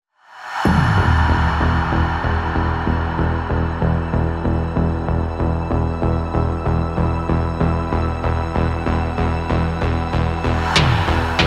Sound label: epic cyberpunk bass